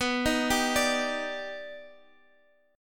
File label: Eb Augmented Major 7th B